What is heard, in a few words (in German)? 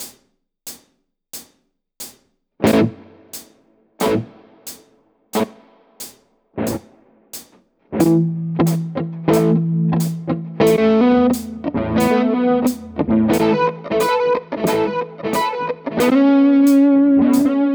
Coole Rhythmen mit Delay 90 BPM Highs Lows; Guitar Rig 7